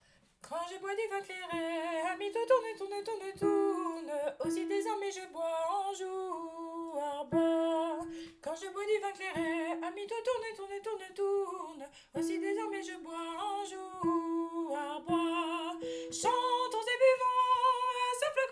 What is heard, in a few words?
Tourdion soprano